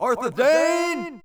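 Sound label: men yell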